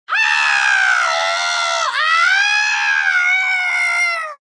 Scream Witch, Female scream, witch in distress, fear, (human voice); Screaming